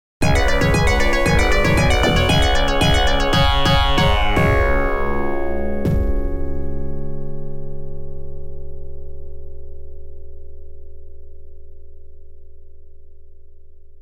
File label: game over